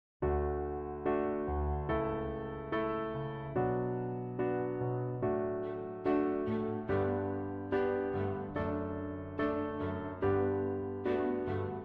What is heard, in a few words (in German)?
Gesang Mehrstimmigkeit